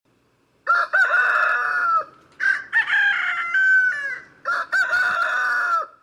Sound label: rooster crowing; iphone ringtone